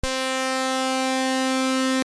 SAW70 SQ30

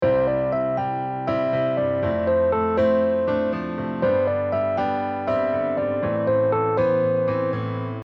melody penta

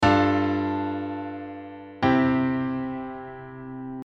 onchord

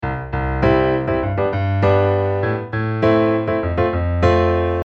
shake beat

